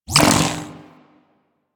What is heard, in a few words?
Link Beam End; Warframe; Warframes; Trinity